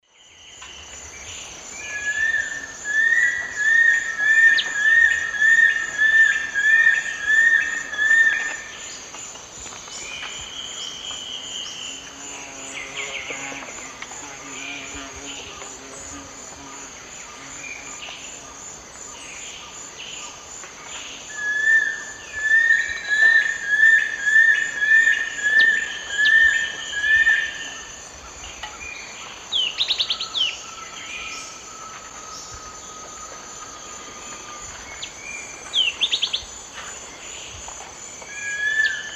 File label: Nasica longirostris, song; S. America; Eastern Lowlands